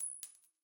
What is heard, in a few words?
count Up; goldengrimoire